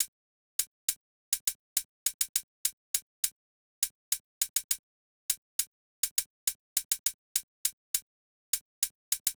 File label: Hat, Drum Tracks; 102 Fmin; Hip Hop; big fish audio; Hip Hop Exotica; Apple Loops